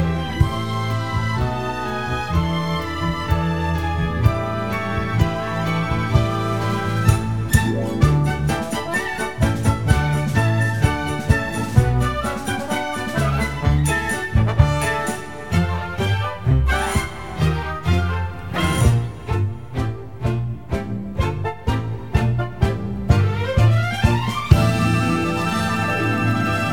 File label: excerpt instrumental